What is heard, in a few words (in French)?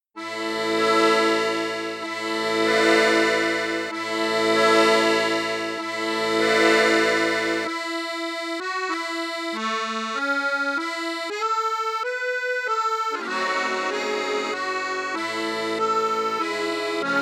registre flute juste flute haute, crucianelli accordeon; crucianelli 25